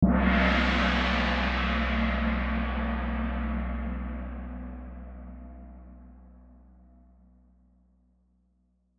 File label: Gong; Split or Steal 2020 gamerip